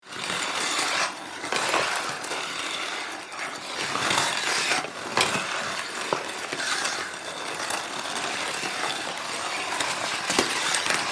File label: Ice hockey player skating movement